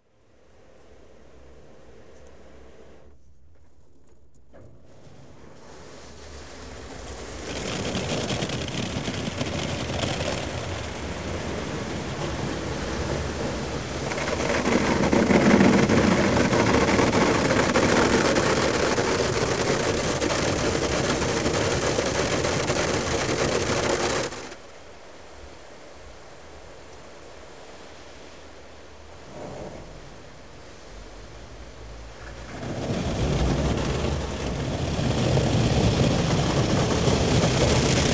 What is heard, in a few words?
car wash- from inside car, Water; The BBC Sound Effects Library